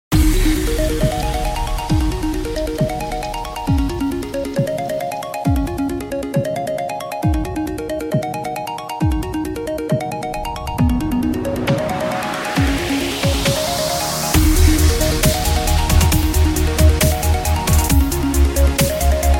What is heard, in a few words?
iphone ringtone, dubstep remix